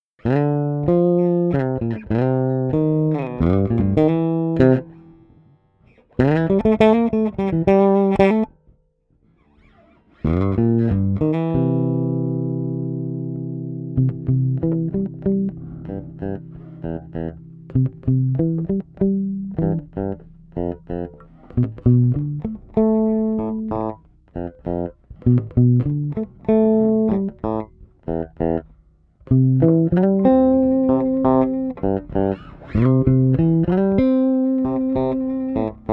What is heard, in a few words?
Blues Lead Tracks; mike lull